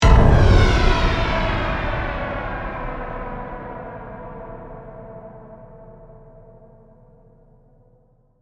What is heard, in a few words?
Hit Heavy Flange; Production Element; BlastWave